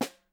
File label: SN, Percussion